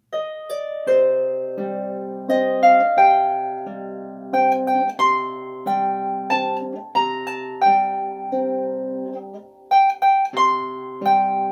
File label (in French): Harpe; complainte de la blanche biche